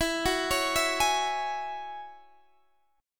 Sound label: F sharp 7th Suspended 2nd E